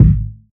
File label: warm kick drum one shot A key